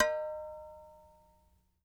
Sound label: Clay Pot stick; Sony CS